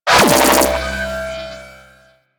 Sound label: Jamming Radar Ring; Warframe; Missions; Railjack